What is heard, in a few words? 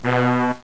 trombone; Web Clip Empire 150,000